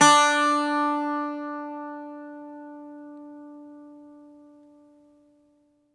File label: Greek Bazouki, Plucked Strings